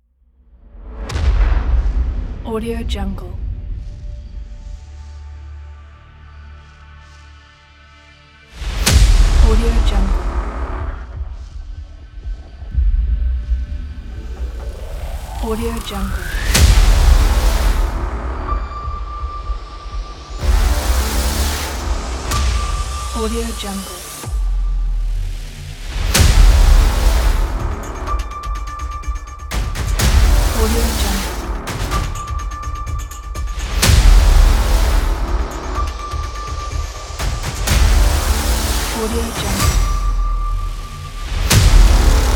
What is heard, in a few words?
action trailer; soundbay